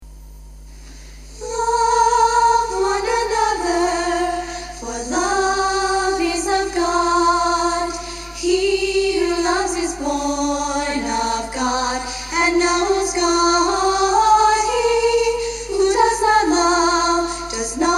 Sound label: music english